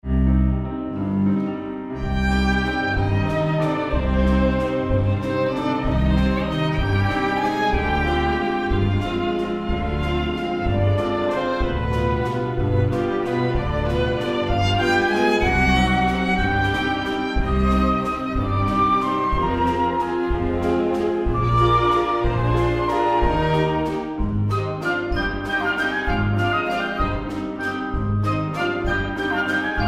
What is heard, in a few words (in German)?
Walzer